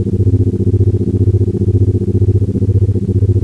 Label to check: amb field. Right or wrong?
Right